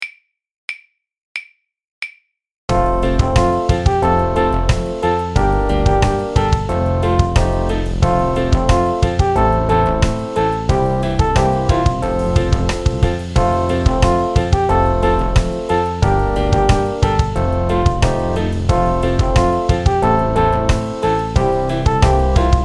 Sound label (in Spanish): sin voz; Salta y Salta